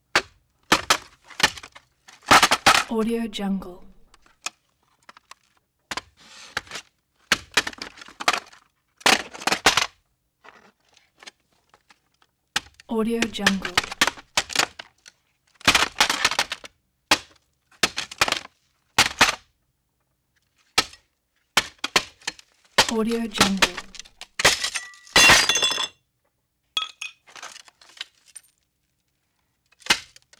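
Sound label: picture frame tumble flimsy banging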